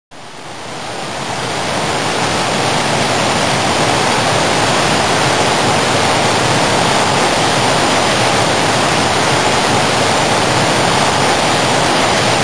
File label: waterfall